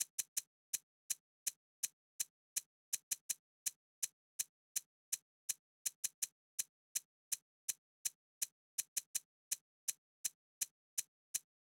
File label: Drum Loop, 164 BPM Shaker, Boom Bap; Cymatics; GHOST (Beta Pack); Darling